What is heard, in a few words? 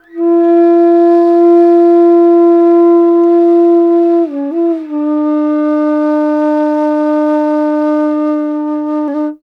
INDIA BASS, IND BASS